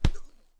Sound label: Punch